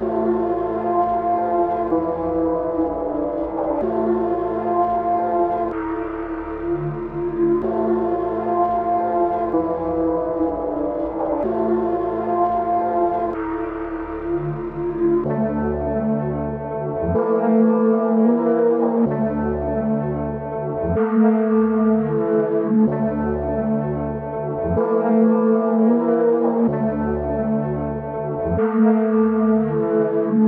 126 BPM D Min, Melody; Fusion; Cymatics; 2022 Melody Collection Platinum Expansion

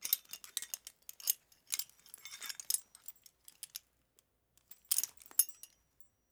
Chains Movement; Fairlight Sound Lib

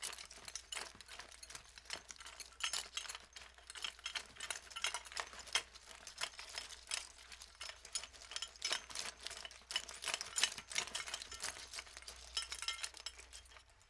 Horse Metal Reins; Fairlight Sound Lib

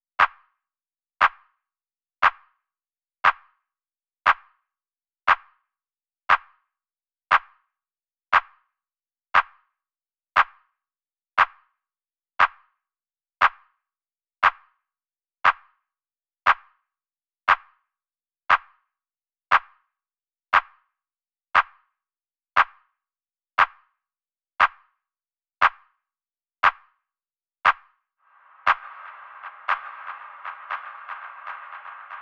Drum Loop - 118 BPM Clap; Loop Stems; Cymatics; Pacha Drum Loop

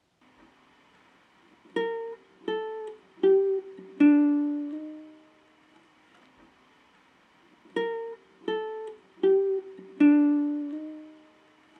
80 BPM G Min Guitar; Loop Stems; Cymatics; Harmony

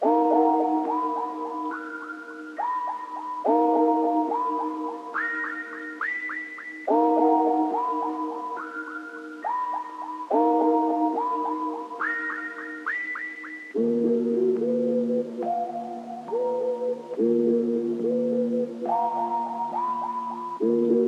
140 BPM D# Min Synth; Melodies; Loop Stems; Cymatics; 2022 Melody Mystery Expansion; Sheisty

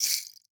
Rainstick Small Shaker; World Percussion; Cymatics; Emperor World Percussion

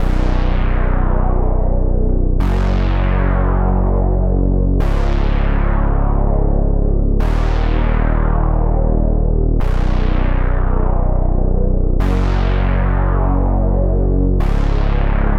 100 BPM D# Min Bass, Pop; Melodies; Cymatics; GHOST (Beta Pack); Broken Heart

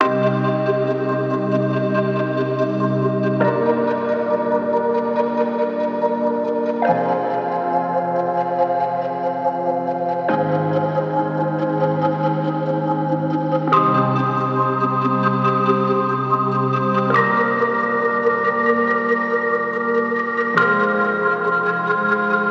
Synth Pad Cm 70BPM; STEMS; Ghosthack; SkyRealm; Mood Kits; Mood Kit 09 - Washed Ashore

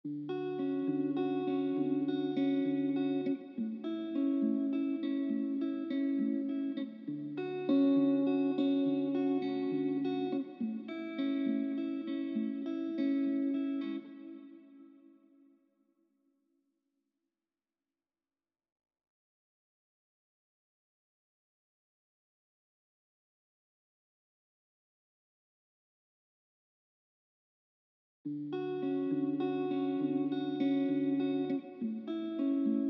68 BPM A Maj Arp, Guitar; Melodies; Loop Stems; Cymatics; GHOST (Beta Pack); Avenue

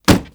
BF Down; Fairlight Sound Lib

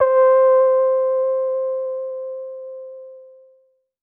KEYS Vintage - C, Synth One Shots

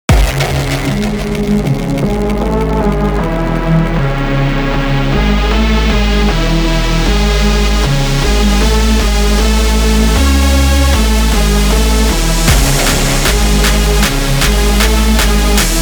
Kit C#min 155 Mix; GhostHack

